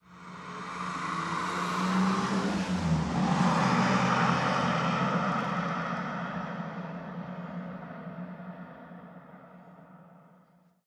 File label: Auto Sedan Passby; Automobiles